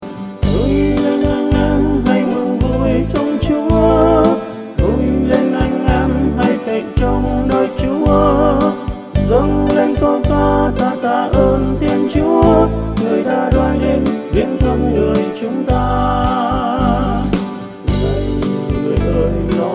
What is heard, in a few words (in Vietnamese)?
Vui Len Anh Em bass